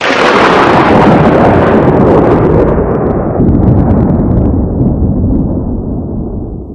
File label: thunder; crampman